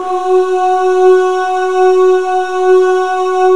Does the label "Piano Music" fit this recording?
No